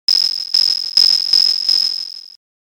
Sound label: insects